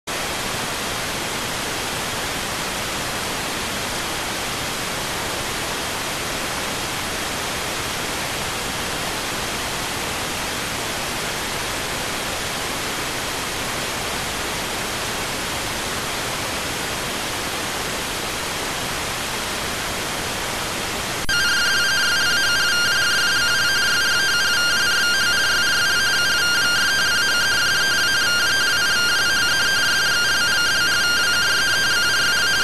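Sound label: radioteletype receive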